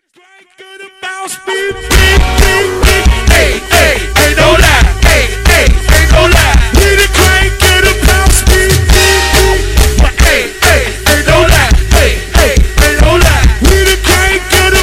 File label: Bounce Beat